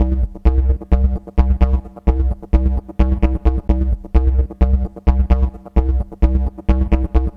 Bassy Riff - Drum Snap; Crunchessor

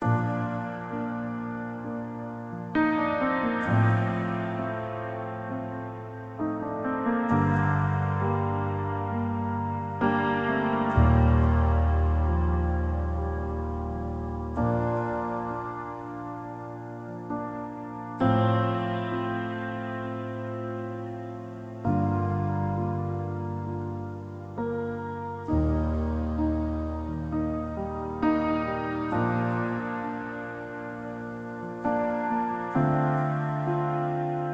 Piano Prelude; Stereo chorus effect plugin; Sizzle

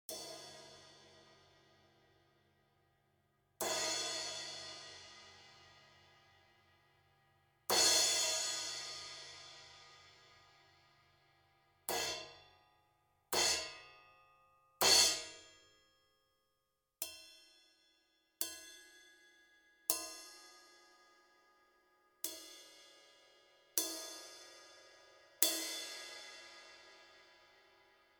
Crash Cymbal 16 - Birch Rutes

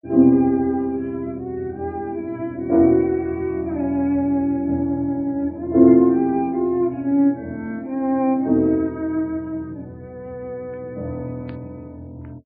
Cello - Denoised; Redunoise

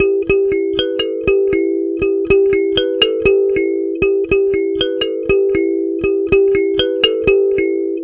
Thumb Piano; Shinechilla

